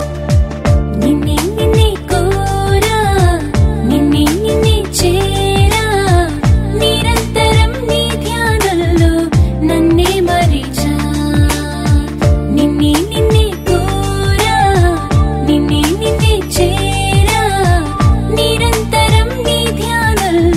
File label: Love Theme Telugu